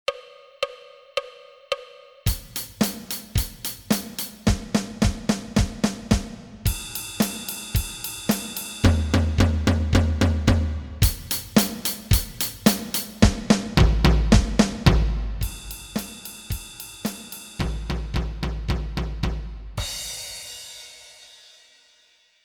Rocker 110

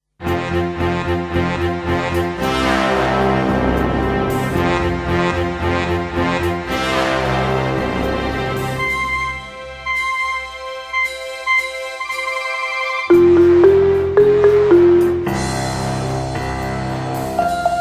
Beat; Su tu gam

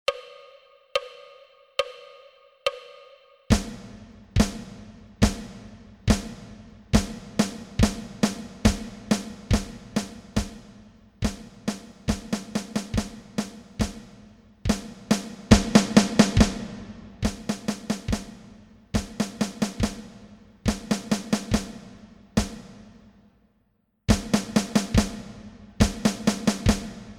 March up 110

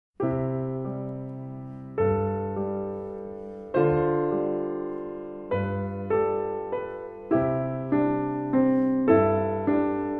Beat; Tach Tra

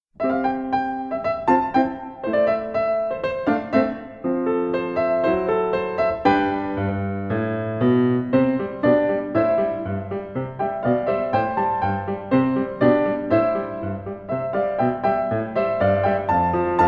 Do Re Mi Beat

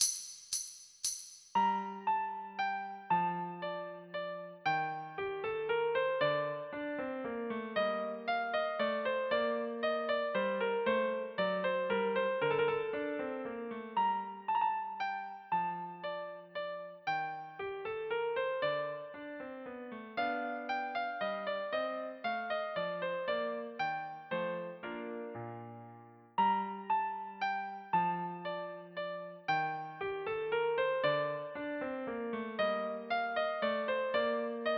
Minuet in G minor; Technical work (Kỹ thuật)

